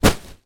office packing peanuts in large plastic bag down on ground; zapsplat